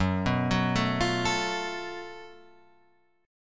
F sharp Minor Major 7th Double Flat 5th, guitar

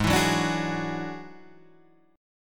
F sharp 7th Suspended 2nd Sharp 5th, G sharp

